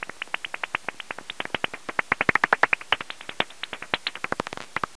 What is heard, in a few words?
Soprano pipistrelle, bat; Philip Briggs